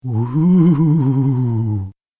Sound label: Wooh Funny Ghost; Ghost Sound Effects; Andrew Fleming